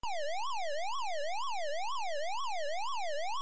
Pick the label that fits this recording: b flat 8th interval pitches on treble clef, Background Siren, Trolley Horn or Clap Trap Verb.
Background Siren